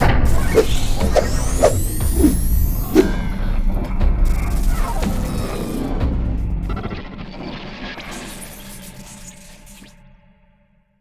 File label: Intro Virtual Female; KickBeat